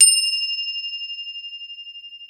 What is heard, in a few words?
CROTALE F6; World Combo